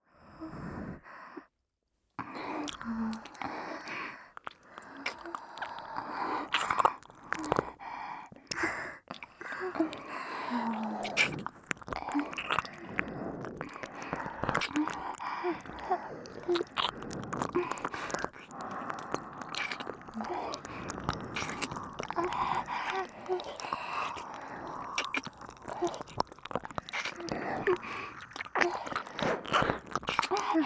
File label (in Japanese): キス：両耳舐め